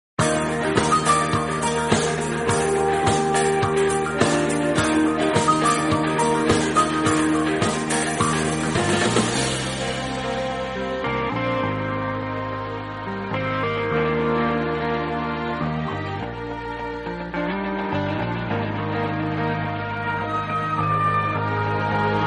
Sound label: Samsung Original Ringtone; ringtones